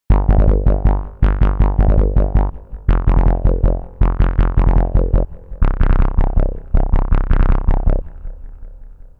gate 16 steps krishna bass; the meditation soundset

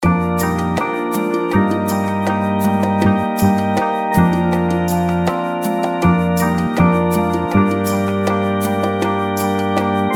arpeggio; fire by music sound software

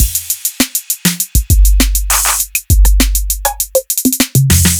acoustic 808s kit; by uppercussion

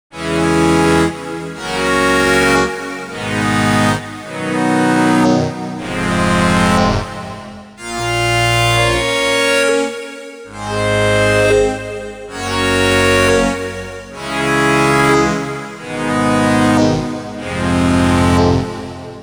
harmonium lead; the digital life

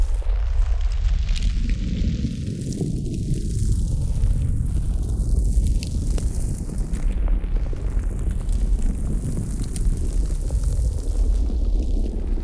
crackling fire; wavefield

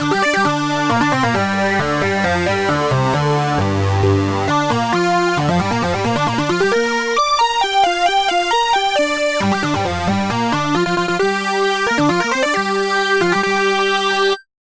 lead; mistress; fusion dance for omnisphere by 12mx media

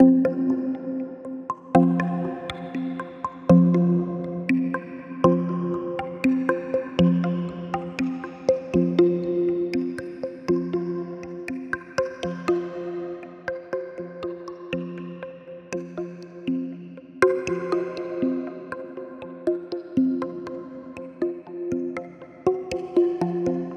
Perc

